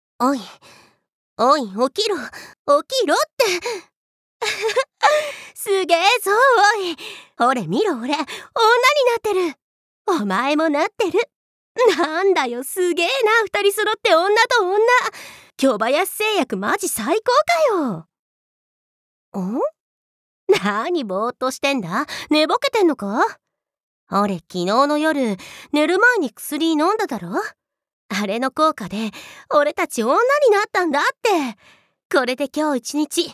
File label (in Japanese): 美少女の百合ディープキス, ASMR